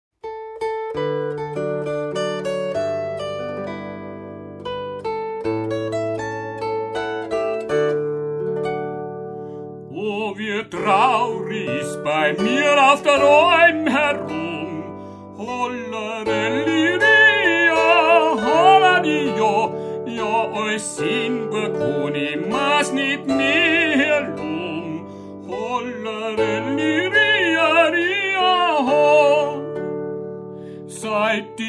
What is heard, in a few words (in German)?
Gebirgslieder; Halbreiter